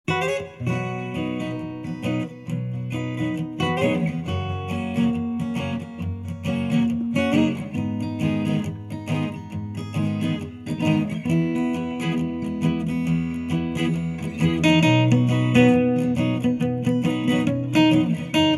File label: two guitars